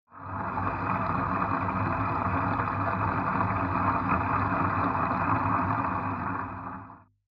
bathtub underwater fill

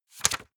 medieval page back

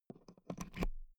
insert euro plug

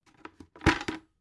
bucket empty handling put down

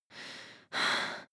breath female aroused intense

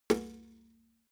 metal bucket hit bottom soft

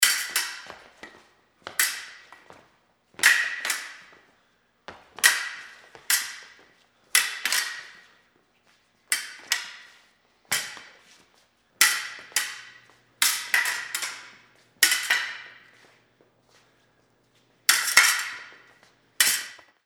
fencing sabre

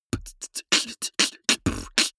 beatbox sequence open

